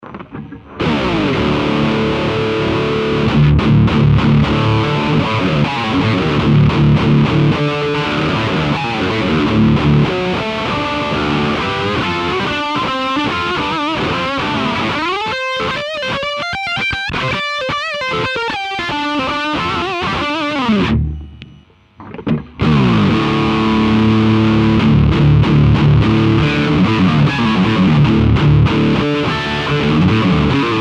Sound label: Black Winter Drive